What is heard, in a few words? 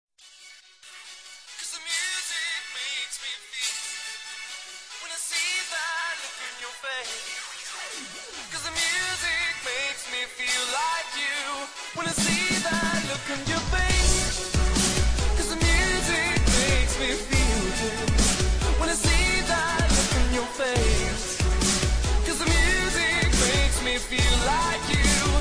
bass boosted, 35Hz